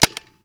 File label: carbine; 68 carbine